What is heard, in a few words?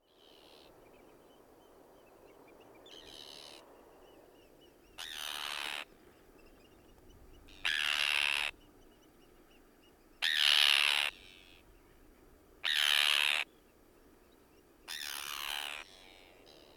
Arctic Tern